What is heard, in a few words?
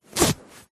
foley clothing jeans denim pants trousers fly zipper; zapsplat